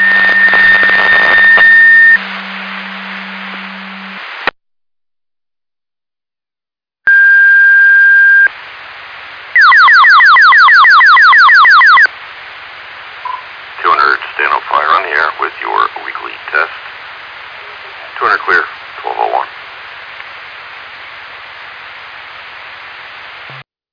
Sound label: Siren; Hamburg FD